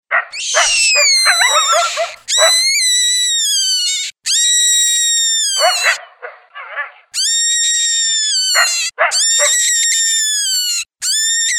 Coyote & Cottontail Distress

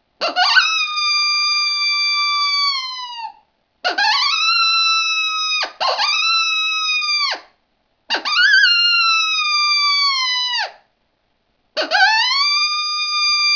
cow tip howl, coyote

